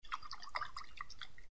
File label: swim